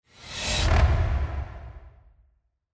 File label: transition